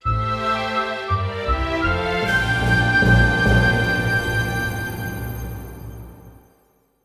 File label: Continue; super smash bros. ultimate expanded vol. 01 super smash bros.; Super Smash Bros. Brawl